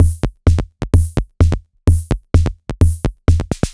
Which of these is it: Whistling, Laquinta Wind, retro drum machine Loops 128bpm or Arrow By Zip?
retro drum machine Loops 128bpm